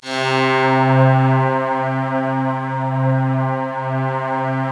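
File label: synth, Korg DSS-1, C3; ProSamples vol.55 - Retro Sampler; Crocketts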